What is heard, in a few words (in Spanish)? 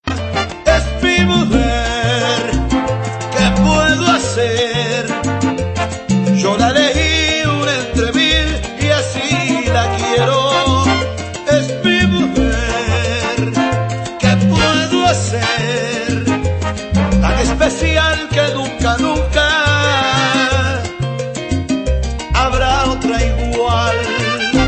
mujer salsa